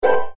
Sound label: bonus get; Danger Mouse - Ultimate